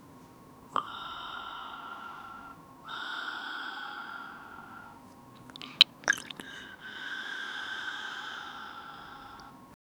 sipping a glass of whisky

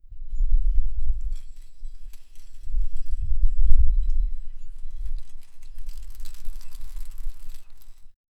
swinging on a tree and, climbing

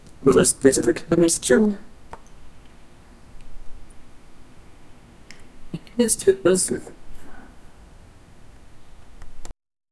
accept sound; SFX Engine

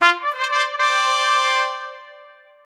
triumphant desert celebra

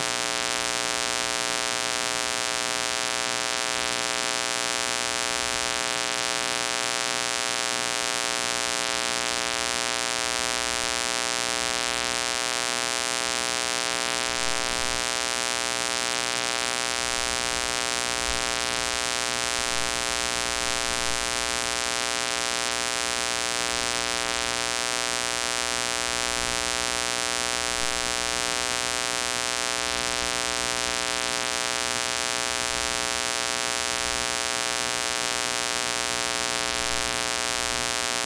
the sound of a magnetic

